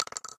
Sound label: Shell Shotgun Bounce; gamerip